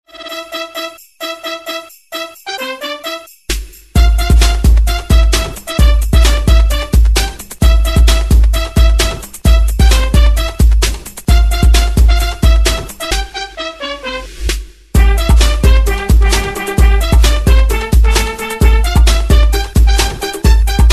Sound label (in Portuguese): funk bate o sino, natal